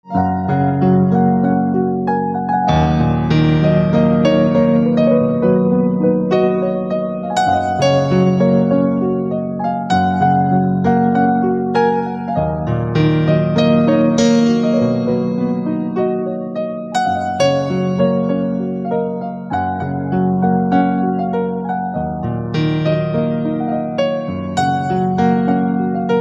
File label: Piano; alarm tone